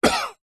human male middle aged cough; zapsplat

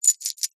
board game tokens metal handful movement hand; monopoly

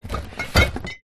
foley bottles wine in cardboard box movement

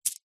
foley stones precious gems in pouch catch

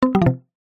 multimedia alert generic mallet wood

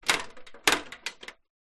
industrial step ladder metal rattle movement

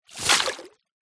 fishing net splash hit water fast

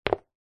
foley footstep single soccer boot on tiled floor

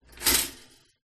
household toaster pop up; zapsplat

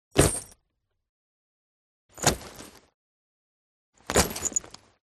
FOLEY CLOTH Leather Metal Bodydrop; smartsound

